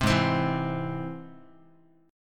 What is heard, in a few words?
G sharp Augmented G sharp Guitar; G#+ strummed; 3,4,3,2,x,x; JGuitar